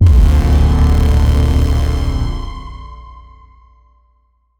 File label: Warning Stinger; Deadlock; black future 88